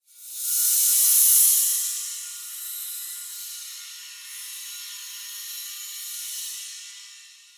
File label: Interface Cue; The Suffering